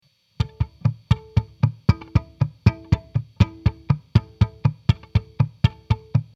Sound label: slap